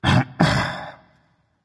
disagree berserker; cuchulainn

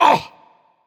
surprised, dragon; fafnir

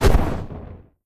recall punch fire; Smite; Susano

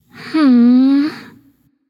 thinking light; smite; voicepack; hel

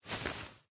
cloth quick; smite; scylla

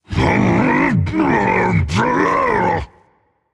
grumble rage; chaac

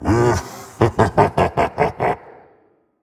laugh

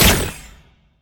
melee impact; smite; vamana

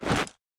movement step; smite; odin

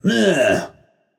tongue; smite; Ullr

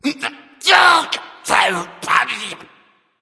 grumbling; cuchulainn

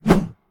whoosh; Smite; god Lancelot

